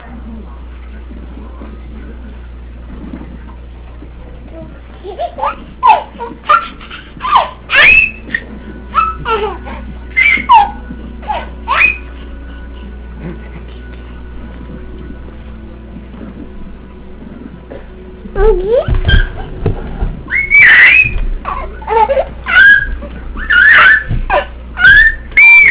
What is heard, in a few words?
laugh